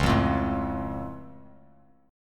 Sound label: C sharp Augmented down Bass Standard, chord; strummed; JGuitar